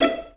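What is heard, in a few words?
violin pizzicato E4; Best Service; Peter Siedlaczek's Advanced Orchestra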